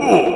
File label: ooooof; minecraft; meme